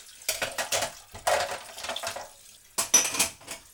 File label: Doing The Dishes Cutlery